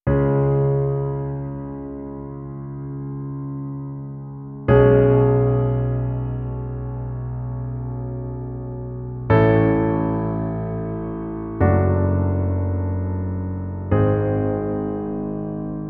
Moonlight, Underscore

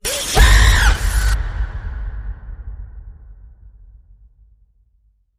Dear Child Scary Scream Slam

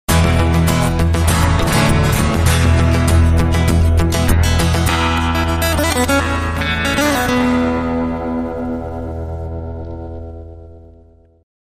Techno Folk

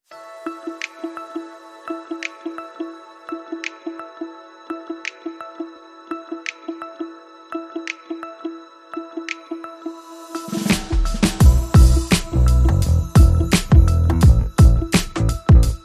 No Piano No Keys

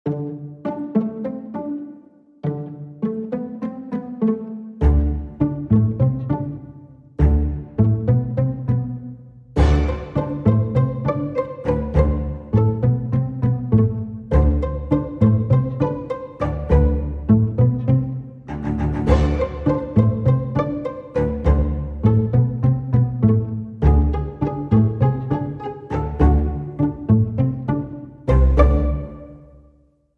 No Drums and Perc

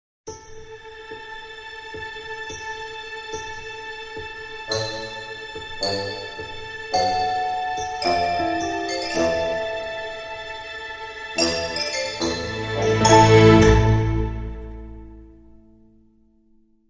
Dramedy Sting, No Clarinet

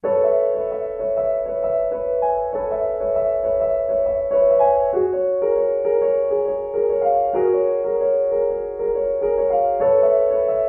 Lapping Water Solo Piano

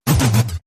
Hollow Sounder

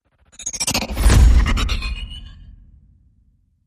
Hit Splitting Atoms

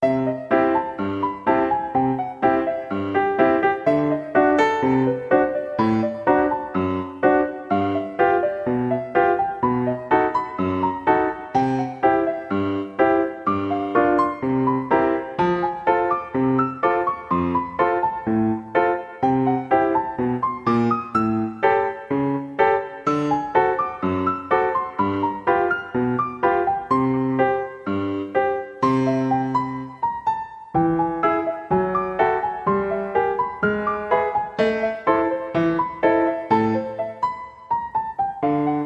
Piano Ragtime Theme

